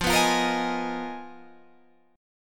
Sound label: G sharp Minor 6th Add 9th F, guitar chord